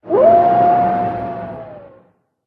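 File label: werewolf buff; oboroten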